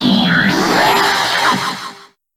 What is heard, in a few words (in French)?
Cri